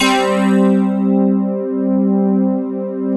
SYN, Wavestation

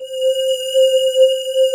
C4 MULTI, CRYSTAL SYN; AKAI S6000 CD-ROM - Volume 1; SYNTHESIZER

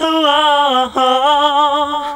"HOOO AAAAR", VOCAL

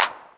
909 Clap, Novation Drumstation

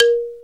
BALAFON A#3; AKAI S-Series CD-ROM Sound Library VOL-5

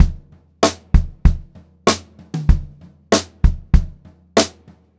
Pop-Rock Drumloops; 96 POP 3

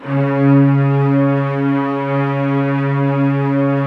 LOW VLNS C#3; Q Up Arts - Denny Jaeger Master Violin Library

